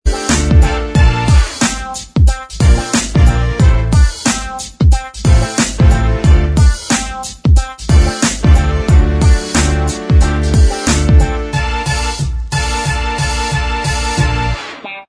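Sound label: instrumental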